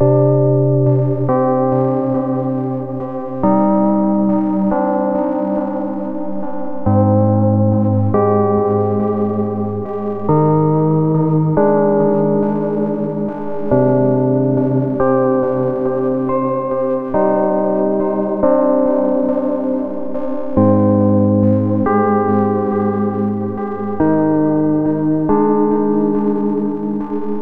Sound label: Detuned Electric Piano Loop Cm 70Bpm, Granular, Lo-Fi; Granular Musical Loops; Ghosthack Advent Calendar; Lo-Fi Kit